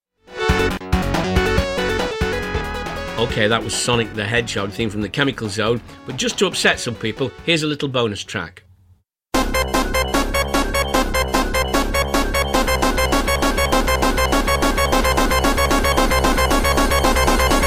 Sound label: drowning rhythm melody; sonic the hedgehog